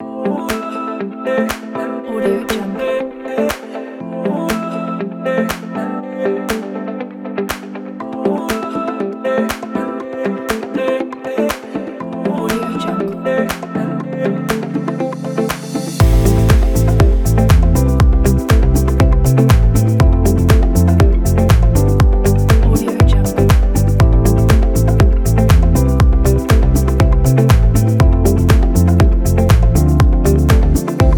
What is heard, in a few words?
luxury chill